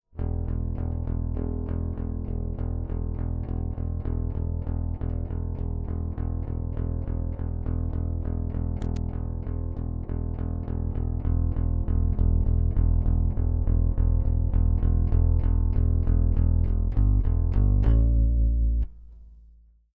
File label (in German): Bass Rickenbacker DI Signal LF Boost Stufenlos nach unten